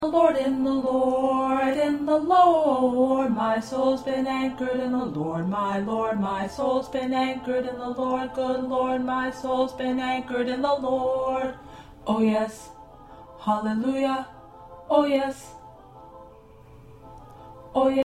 2nd Tenor

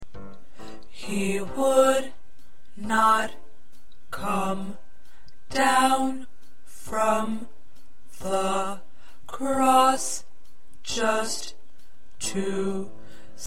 Tenor

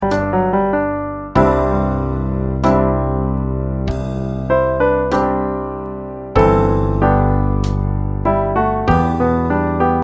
Accompaniment

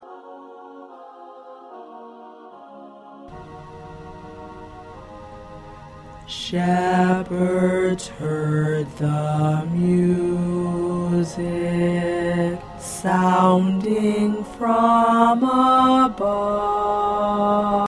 Tenor 2; christmas songs for choir; part preview

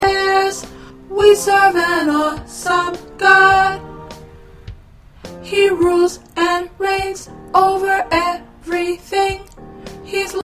Alto

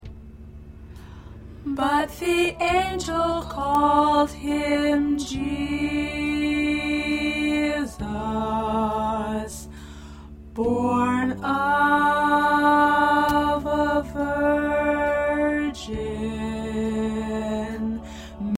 Alto, choir

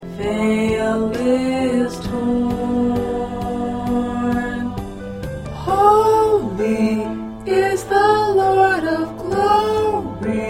Soprano, choir parts

